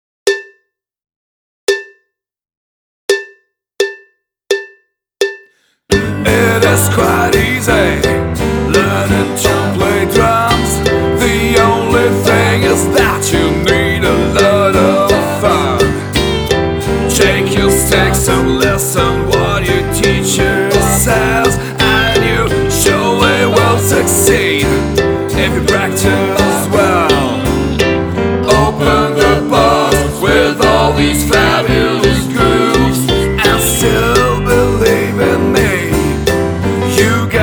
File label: Playback 85BPM